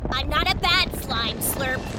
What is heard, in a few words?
bad slime